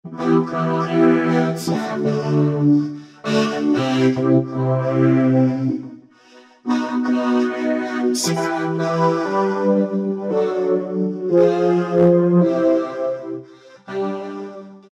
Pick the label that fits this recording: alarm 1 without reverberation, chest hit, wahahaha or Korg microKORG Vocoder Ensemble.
Korg microKORG Vocoder Ensemble